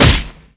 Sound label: bail slap; skater; Tony Hawk's Project 8; XBOX